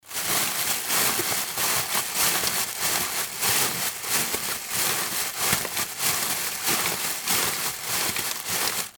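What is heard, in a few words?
sound of rustling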